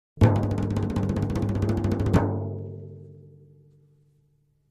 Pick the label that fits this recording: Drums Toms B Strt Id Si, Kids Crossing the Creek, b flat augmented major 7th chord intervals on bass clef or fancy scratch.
Drums Toms B Strt Id Si